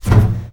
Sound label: metal jump